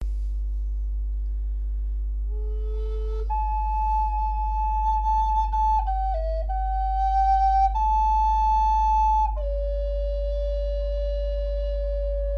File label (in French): Son flute amerindienne la chataignier